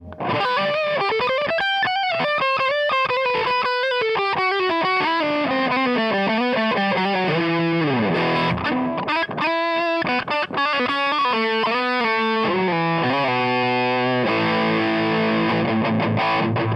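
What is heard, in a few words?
high gain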